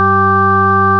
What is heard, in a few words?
b3 synth; modulation